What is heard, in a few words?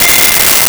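Mute Trumpet F5; Nintendo; July 2020 Gigaleak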